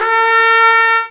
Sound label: trumpet A#3, AKAI S-612 + S-900; Retro Sampler